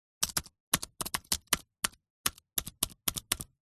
computer keyboard apple mac magic typing short sequence